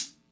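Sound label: classic hip hop Dry hi hat; STYLE KITS (Hip Hop, Etc); Classic Hip Hop Mega Kit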